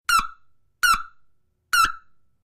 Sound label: Alarm Synthetic; hi-tech-various